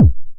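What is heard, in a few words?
909 BD tape, kick drum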